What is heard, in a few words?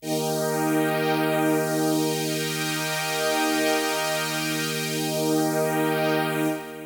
Melody; Techno Music Maker